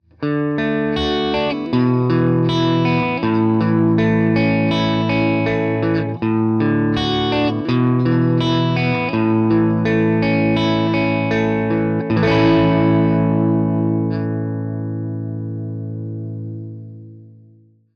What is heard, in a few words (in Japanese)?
アルペジオ